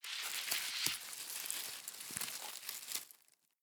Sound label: leaves, foraging